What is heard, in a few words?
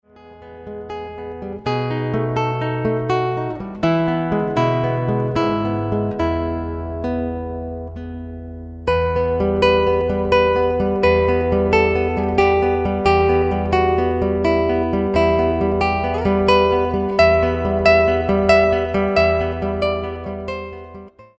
romance, guitar